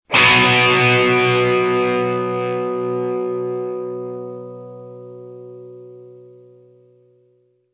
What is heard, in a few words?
guitar